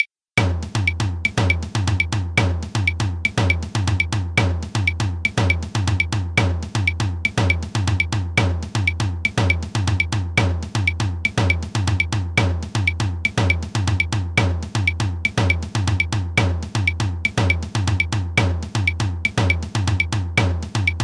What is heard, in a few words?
mozambique bombo